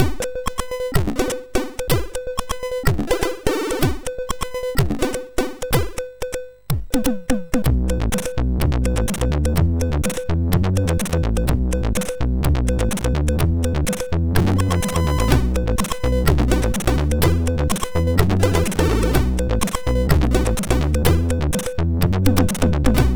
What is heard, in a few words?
Dull Jazz, MOS6581R4